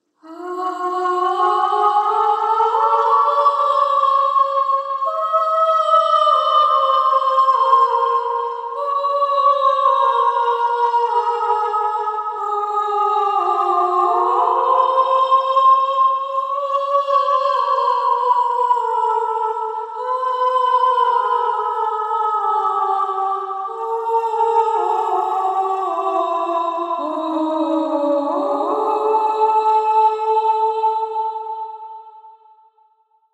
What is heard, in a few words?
Female Vocal